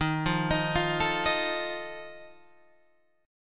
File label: Eb Suspended 2nd Flat 5th Eb Guitar Open G